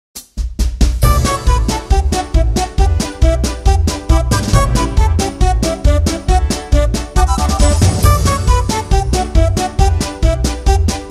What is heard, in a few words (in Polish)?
Nagranie rytmicznej melodii